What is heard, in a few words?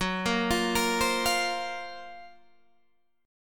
Gb Major 7th Flat 5th Guitar Standard; GbM7b5 arpeggiated; JGuitar